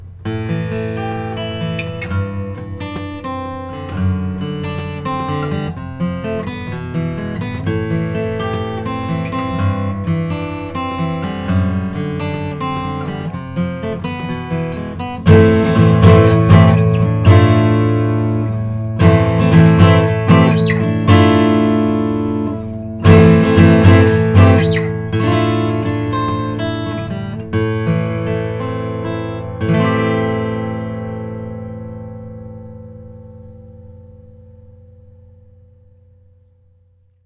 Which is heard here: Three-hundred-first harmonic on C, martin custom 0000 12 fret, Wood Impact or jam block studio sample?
martin custom 0000 12 fret